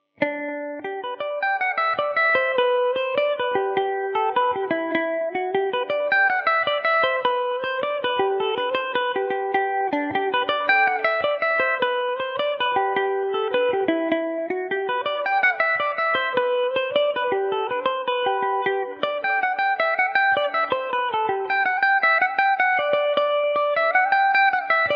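big barneys jig G major revelation revisited, mandolin; Revelation Jaguar electric mandolin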